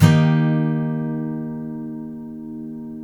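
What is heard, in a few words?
steel D# major down, CHORDS STEEL, Guitars; Spectrasonics; Hans Zimmer Guitars VOL-1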